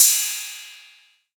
Royality free crash cymbal sample tuned to the D# note; D sharp key; drum sample; one shot